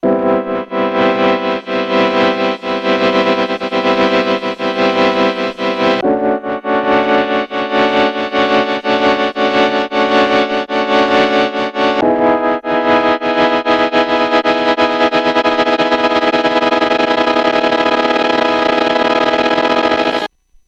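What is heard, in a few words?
MOD Devices MOD DUO X J6 Stereo Ringmod GX Cream Machine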